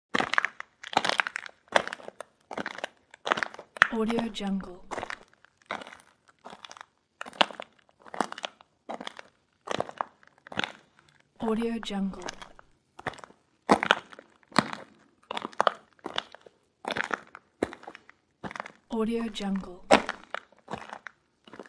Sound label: footsteps on stone walking